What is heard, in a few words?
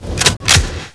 shot cock; Nukem3d